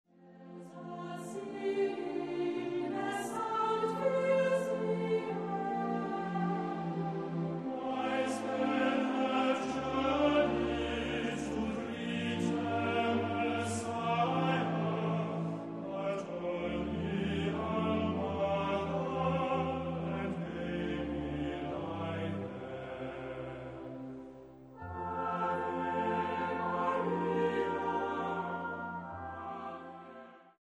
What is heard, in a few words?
Christmas Lullaby